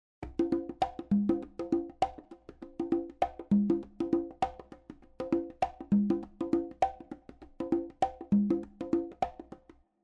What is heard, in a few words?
Conga Stylus